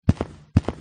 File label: running; Hospital Horror